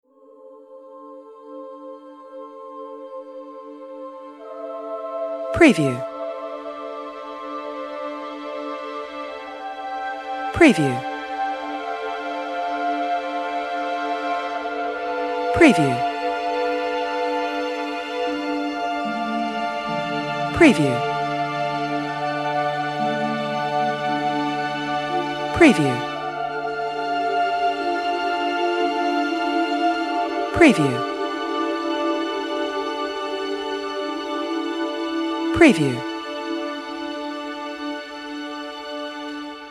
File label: Cinematic Orchestral Strings Film Score Fantasy Soundtrack